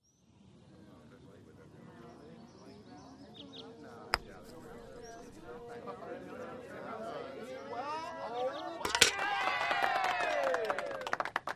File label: Golfing Sequence Put